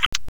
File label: Sent Message; ODST